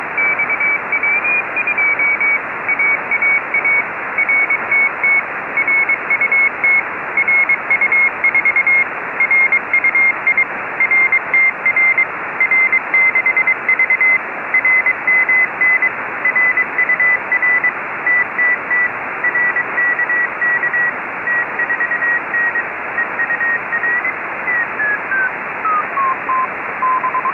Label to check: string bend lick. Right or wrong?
Wrong